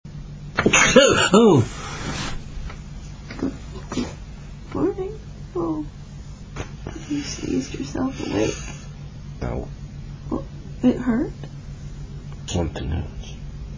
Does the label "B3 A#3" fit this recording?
No